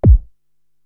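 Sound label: BD, bass drum, drum machine; Korg TR-Rack; Prisoner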